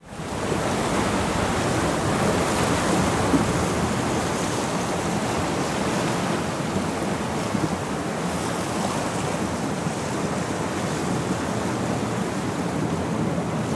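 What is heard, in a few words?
Hamburg Port ambience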